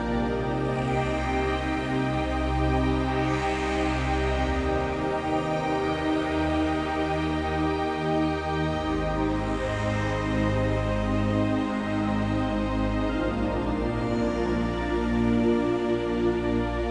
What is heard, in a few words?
Calm Loop Intro Pads; Gunjitsu; Imphenzia